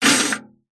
foley keys large bunch prison guard place into metal security box